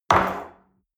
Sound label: espresso place on table slide medium, restaurant cafe